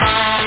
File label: guitar